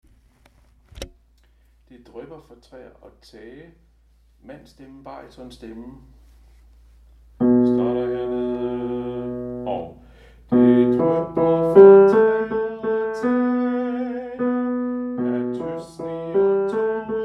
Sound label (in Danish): baryton, koret; harlev koret